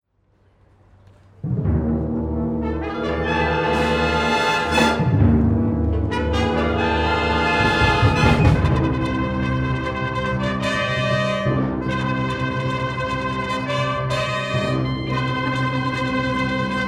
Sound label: Fanfare, College Band; SDSU